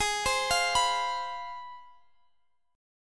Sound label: C Major 7th Suspended 4th Sharp 5th, G sharp, chord